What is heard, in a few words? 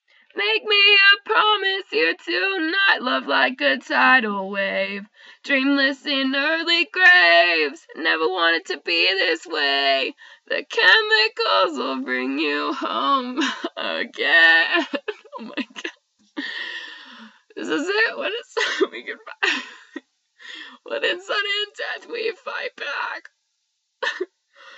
me singing awful